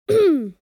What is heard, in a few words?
Clearing throat boy kid child